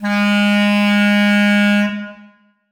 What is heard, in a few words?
bass clarinet g#3; Sonatina Symphonic Orchestra